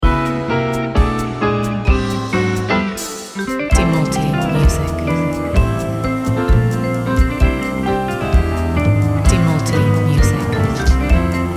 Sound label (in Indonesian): jazz, Lagu jazz instrumental